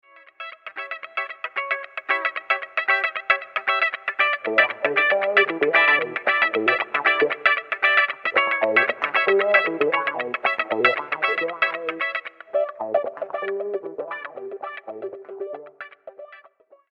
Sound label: clean guitar rythm and funky pickin